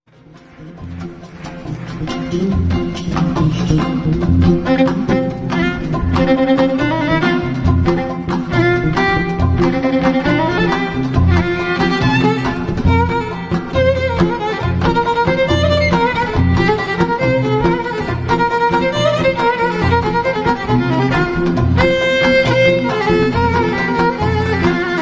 kemanla guzel bir arap solo

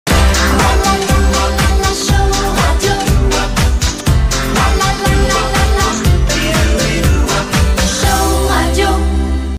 radyo jingle; Show Radyo

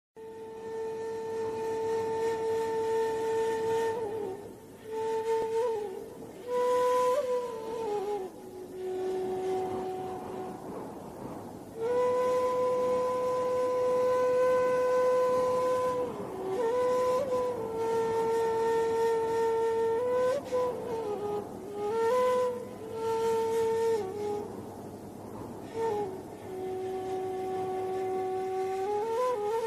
dizi muzigi

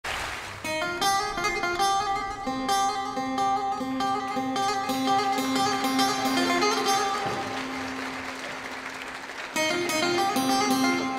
baglama solo; acropolis odeon da

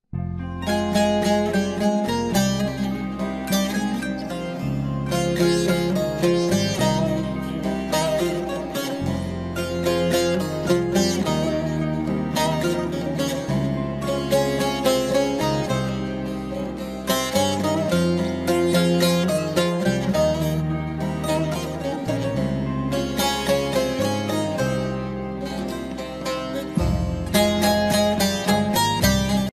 telefon zil sesi; gonul dagi dizi zil sesleri

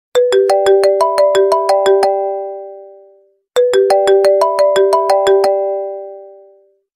iphone zil sesi

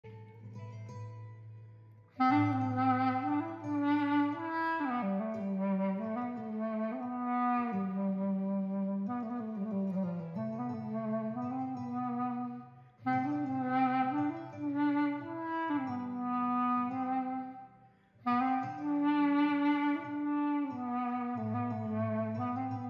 klarnet vers

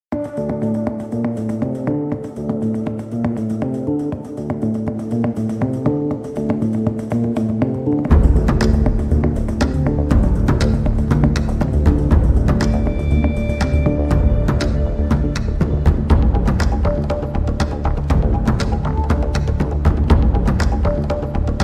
dizi muzikleri; sahane hayatim

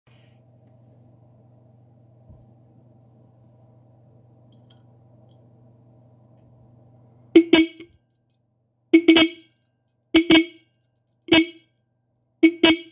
korna sesi; hella

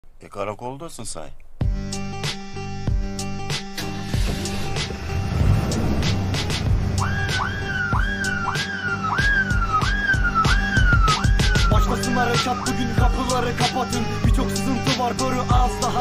rap orjinal müzik; Boru 4 Bolum

